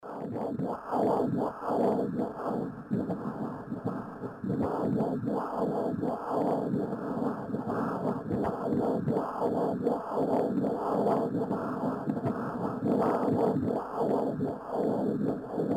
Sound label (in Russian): Sonograf